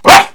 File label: Dog Low; Animals